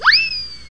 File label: zing